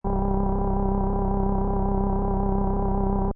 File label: Machine Rumble Loop, continuous rumble in a retro style; for starship interior or machines operating; Lynne Music